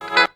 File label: notifier dididi; ZTE Open-C